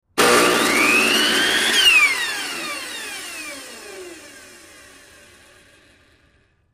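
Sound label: High Speed Large Table, saw; Wood Tool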